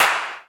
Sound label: CL, claps; EastWest; Dance Mega Hip Hop Electro